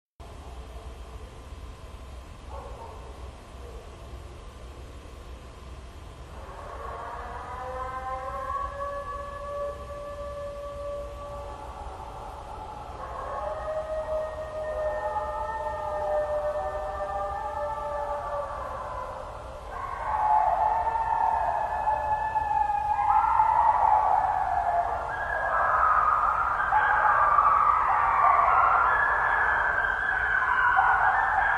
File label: bigfoot and coyotes sing; sasquatch; Hughes